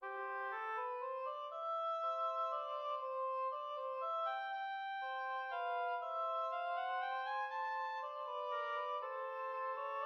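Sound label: study 2 oboes; J.J. Friedrich Dotzauer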